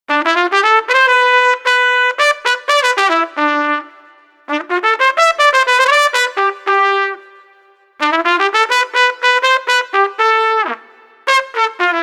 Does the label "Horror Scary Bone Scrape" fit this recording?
No